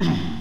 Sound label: fall